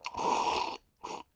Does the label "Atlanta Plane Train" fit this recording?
No